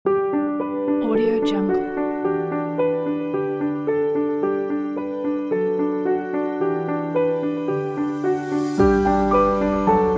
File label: gentle piano